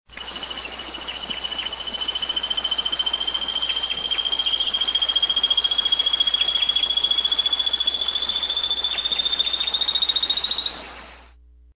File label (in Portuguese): Conopophaga melanops Cuspidor de máscara negra